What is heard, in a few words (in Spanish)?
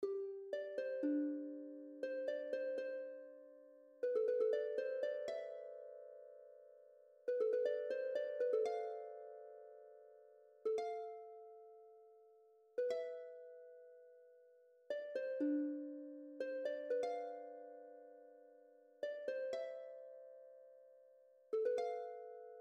Arpa